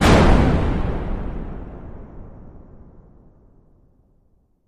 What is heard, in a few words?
Rocket Shoot By, space rocket